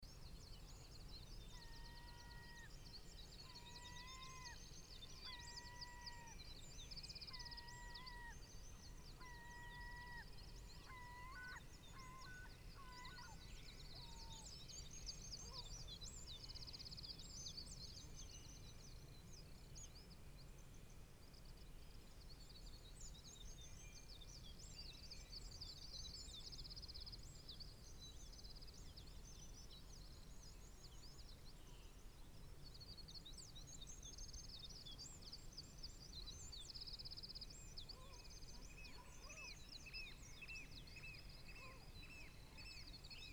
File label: dawn